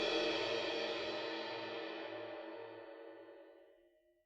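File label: suspended cymbal roll f release; Idiophones; Struck Idiophones; Suspended Cymbal 1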